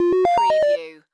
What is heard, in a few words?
Chime Musical Vintage